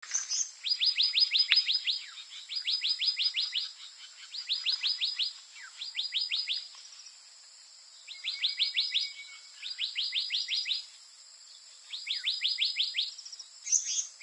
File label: black necked monarch